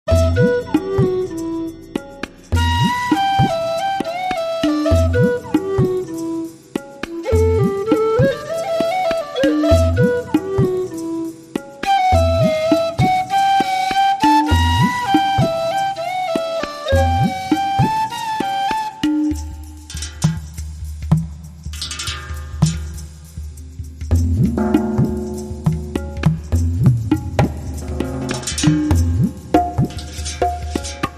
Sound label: Whistle Bowl